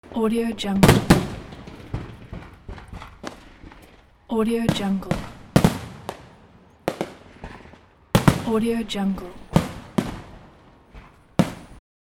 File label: fireworks feast